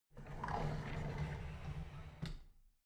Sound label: drawer big clatter close soft